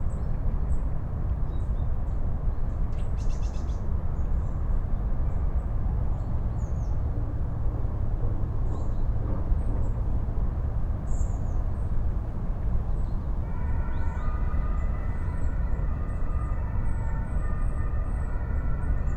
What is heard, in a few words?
Distant City Ambiences; Detunized